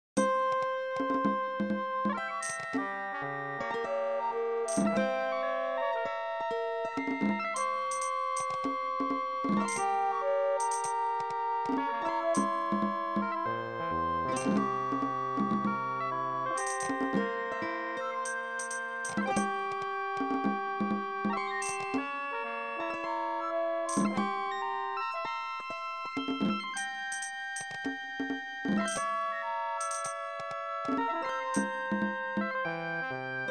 thai mode, fractal music; hocketting

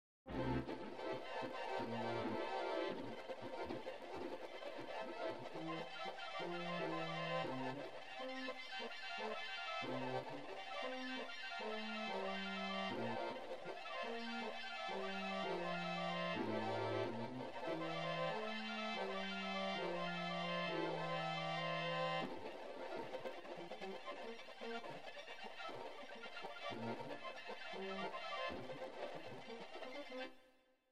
arabic 17 tone maqam Iraq

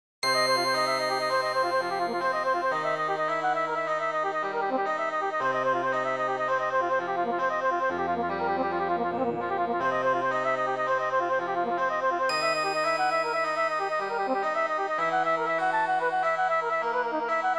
old indian gamut

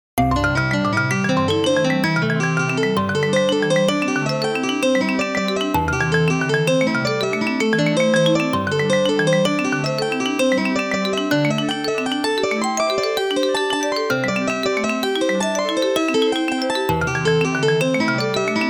polyrhythm version, fractal music

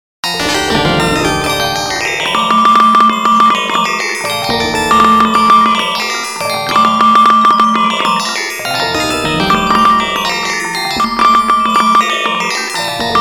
vibraphone texture; fractal music

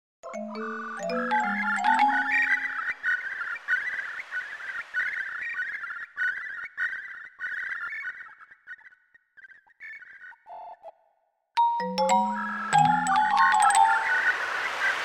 curlew fractal tune

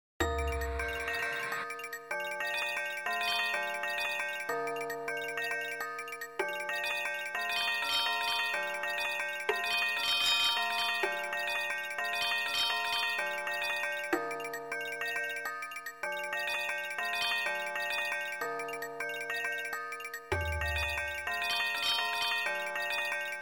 fractal percussion major chord

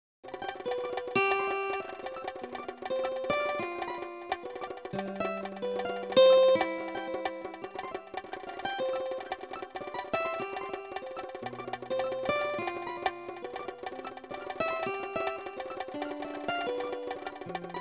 guitar not polyrhythm

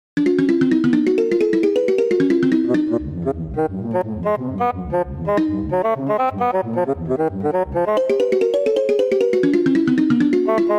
oboe and kalimba; tscape

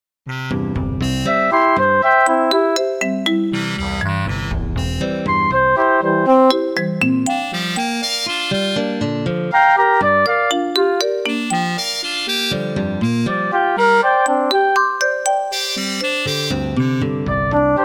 jungle frog chorus

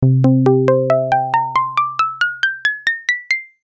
harmonics to 16 plucked

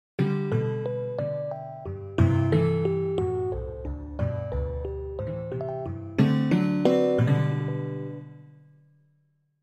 six eight test

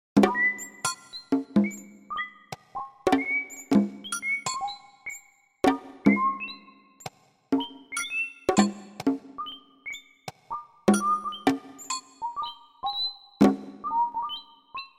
bird calls with Afro Carribean percussion

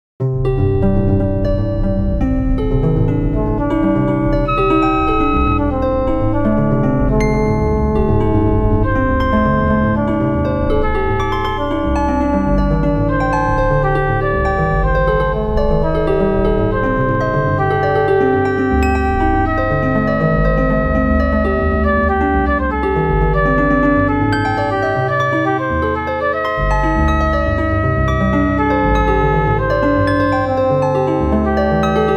changing rhythm 2 3 2 7 8 rhythm; fractal music; Transforming seed

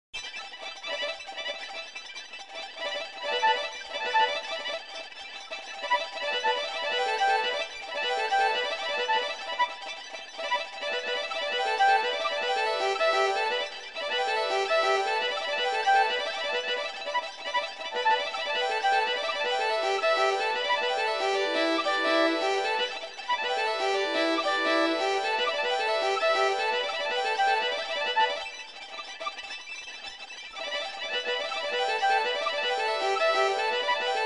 virtuoso violin with flute

